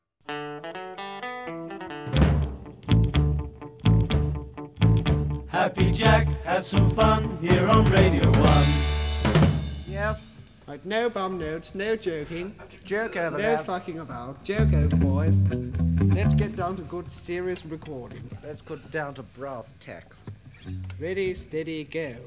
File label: Jingle